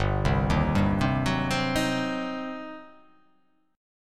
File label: G Major 7th Suspended 4th Sharp 5th, G, down